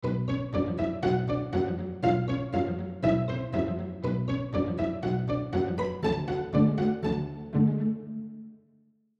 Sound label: Logic Pizzicato String Ensemble; Hall of the Mountain King